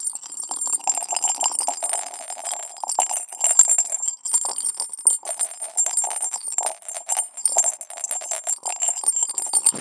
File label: Jingling Ball